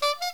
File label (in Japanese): 角笛